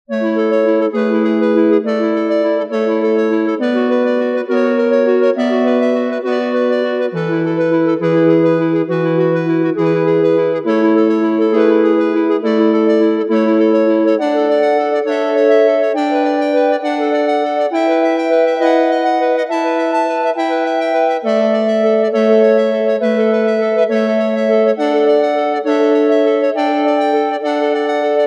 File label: christmas ringtone